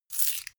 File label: coins grab